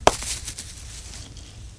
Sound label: Golf Putt Med Hard Sol